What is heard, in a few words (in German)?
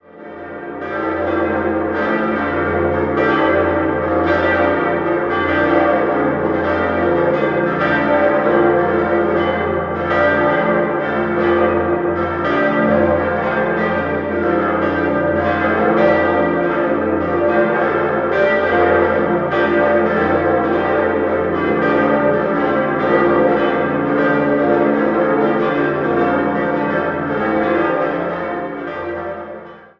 Muenchen, Glocken; Peter